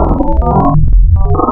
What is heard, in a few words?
KORG VS, wavetables, Classic Synths